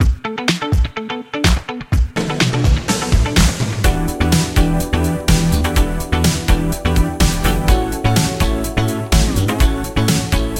Inst; fridaynightfunkin

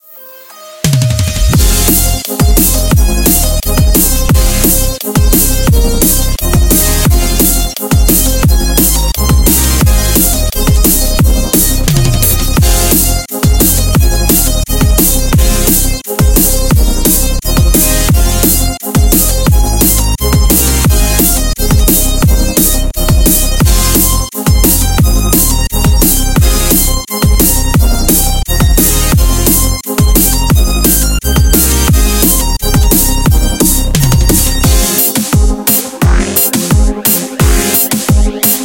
win; tekpro

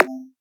note Lay; Friday Night Funkin; charting Sounds

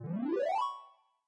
lift off; adarkroom

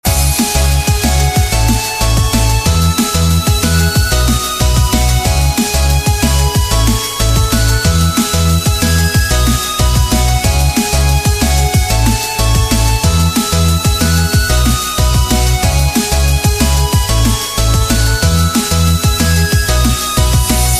music gameplay

